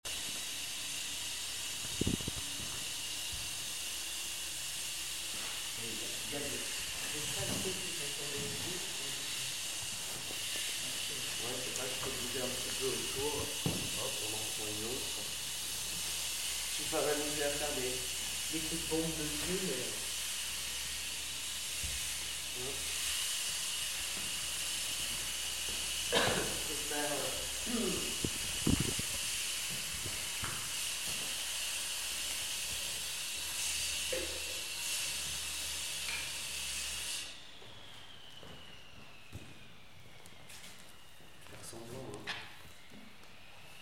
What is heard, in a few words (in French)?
AMBIANCE; CHEZ PASCALE KUTNER